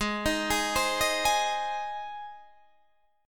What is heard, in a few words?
Ab Major, down; Ab arpeggiated